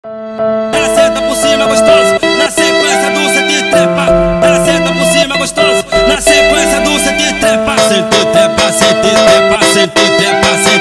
Funk

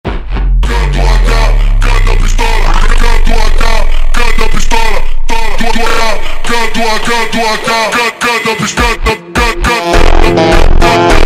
Phonk MONTAGEM Super Slowed

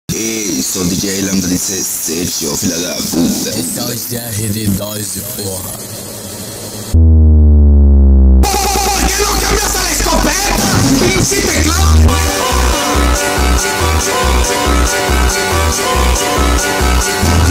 Phonk

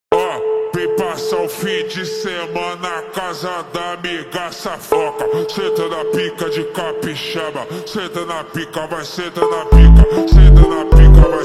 Phonk MONTAGEM Slowed

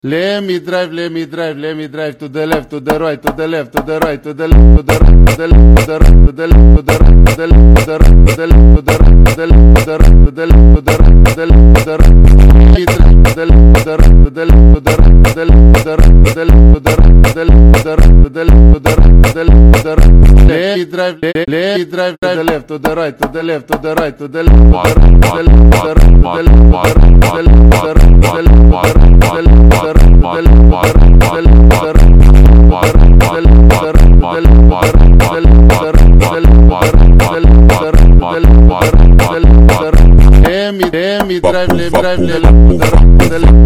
Phonk Slowed

